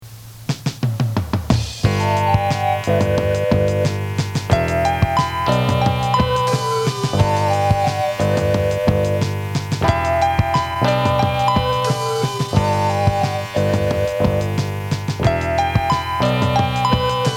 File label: Instrumental